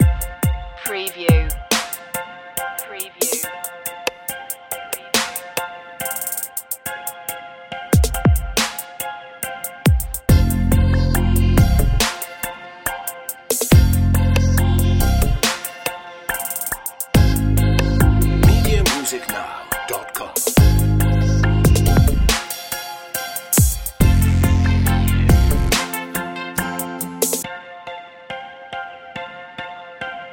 your own trap

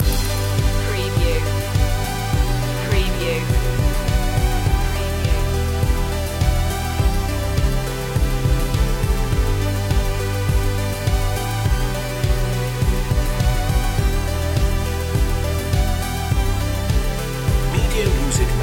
deep dreaming loop

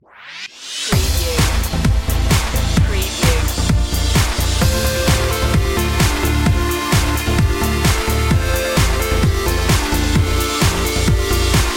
laserbeam

